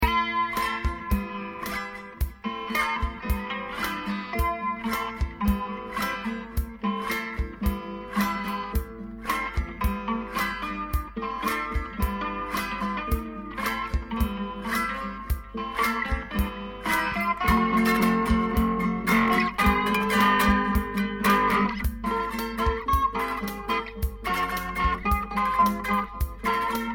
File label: reaggae moon howlin